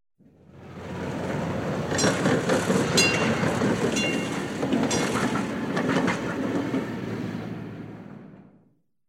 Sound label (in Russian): Parovoz proezhaet kolokol